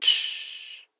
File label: shaker